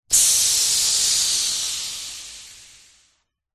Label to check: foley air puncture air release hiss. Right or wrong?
Right